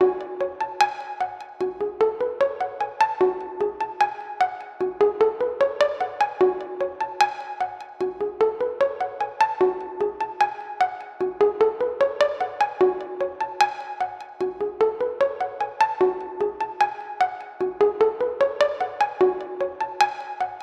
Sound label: 75 A Sea Life String Plucks